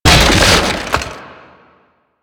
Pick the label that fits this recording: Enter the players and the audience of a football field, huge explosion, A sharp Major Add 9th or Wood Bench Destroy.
Wood Bench Destroy